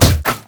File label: bear basic attack; LoL; annie base